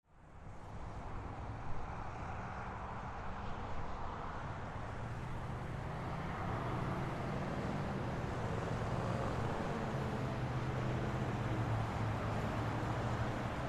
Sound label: Traffic Light; Traffic And Car; Ambience; Traffic and Cars; Blastwave